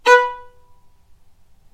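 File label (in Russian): звук ноты си первая октава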